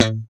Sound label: GTR Funky Strat, Funk & Mute